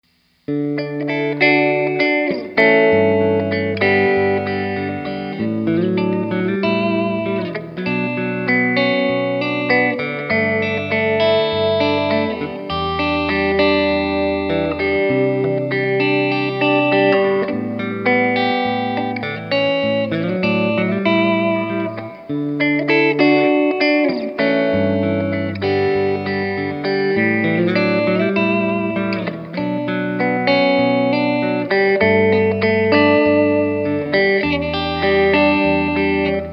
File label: les paul clean